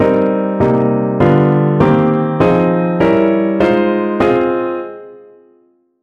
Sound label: drop 2 chords, piano